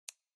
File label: btn click